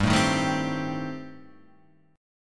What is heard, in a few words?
A-Minor 7th Flat 5th G, chord